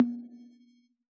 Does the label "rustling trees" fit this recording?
No